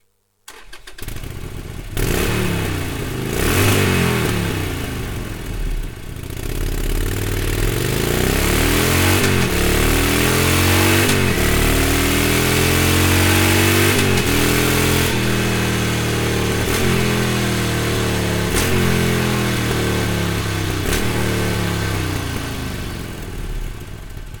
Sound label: Sportster Exhaust Stock; motos exhaust; Sportsters; arrow-mohican